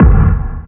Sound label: KICK PRO, Disco House; USB Soundscan vol.20 - Fresh Disco House I